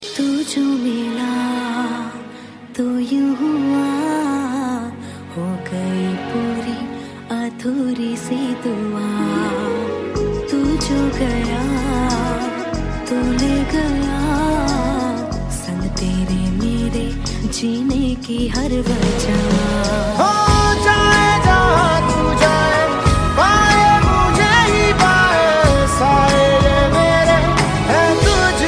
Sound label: Female